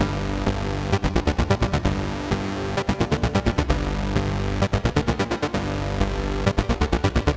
130 Cm synth, Synth Loops, Trance

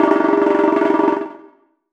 timp; musicradar; cartoon caper

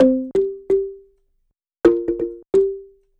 Marimba 130bpm C, African; Quazibeat; African Secret

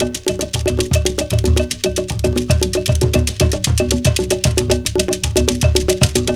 DRUM CAB BELL; Zero G - Ethnic

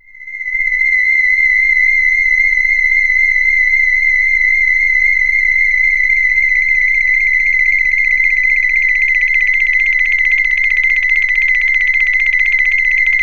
SHINE, PSYCHO

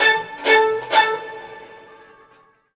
STRG; USB Soundscan vol.32; Roots Disco; AKAI

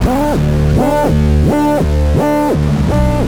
Bass FX

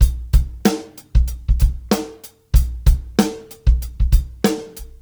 Live Beats, R&B Drums

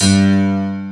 PNO, PIANOS KEYS; Pro-Rec; Synthsonic

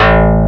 DX BASS; E-MU Formula 4000 Series Vol. 2

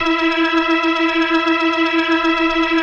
E4F, B3, Vintage Keyboards

